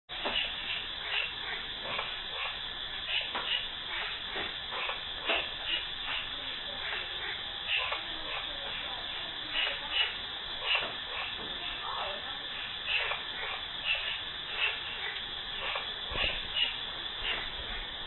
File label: Frogs At Night; Mergui